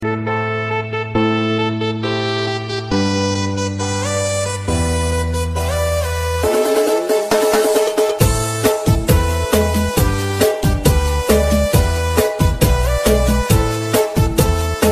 Melody Thai Lan remix

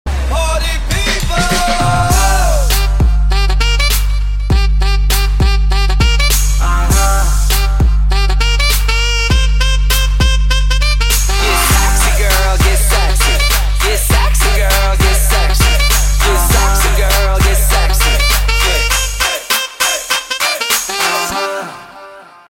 Nhac chuong

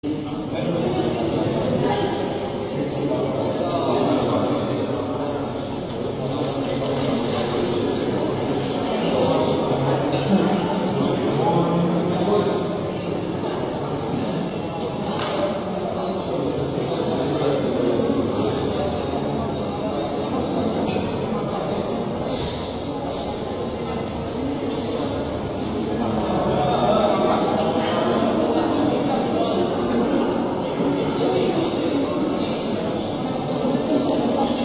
Tieng noi chuyen on ao

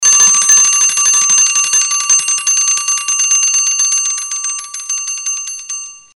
Tieng chuong reo bao thuc

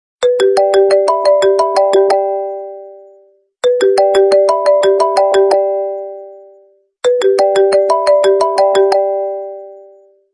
Nhac chuong Tieng moc cam

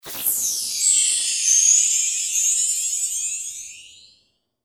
Am thanh bien hoa

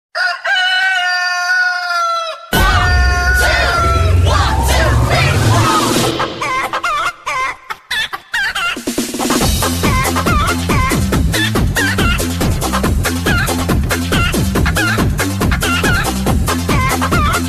Nhac ga gay remix

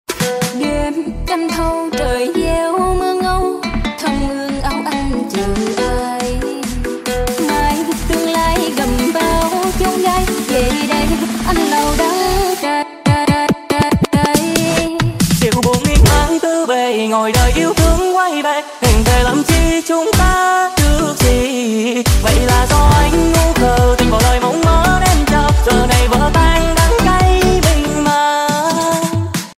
nhac chuong remix

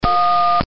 Hieu ung am thanh loi

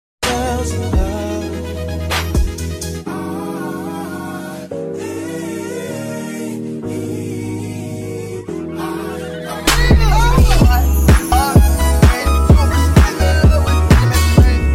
Nhac chuong remix; TikTok

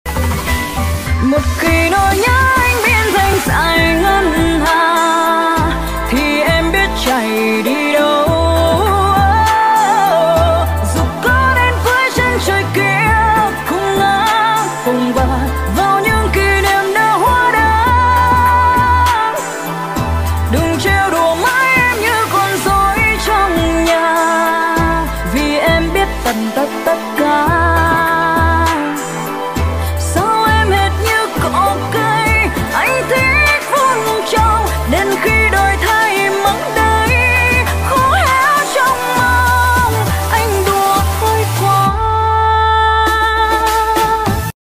nhac chuong giong nu cover

